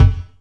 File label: Strange Kicks, Percussion; Dance Synths